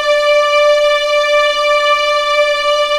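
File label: D5 legato forte, violins; East West; The Ultimate String Collection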